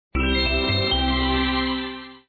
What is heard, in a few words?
1-Up; Tails Nightmare 2